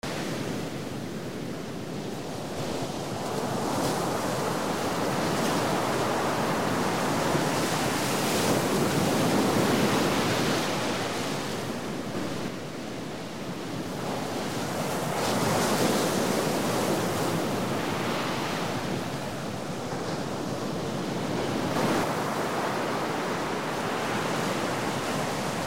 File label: waves fast and close